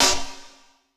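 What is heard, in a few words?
snare drum, Drum & Bass, 160 BPM; Ueberschall; Drum & Bass Resonance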